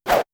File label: Swoosh; inner demons